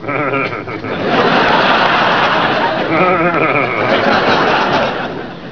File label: bean exclamation